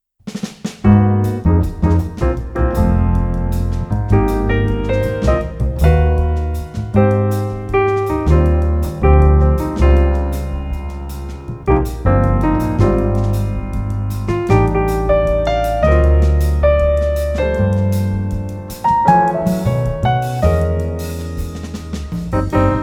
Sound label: Jazz Trio